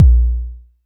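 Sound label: vermona drm1 mk3 bass drum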